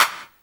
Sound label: CLAP HOU, Dance Mega Drums; Best Service